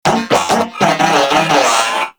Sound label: Moa Vocal Idle; Warframe